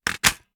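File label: reload empty mag in, shotgun; gmod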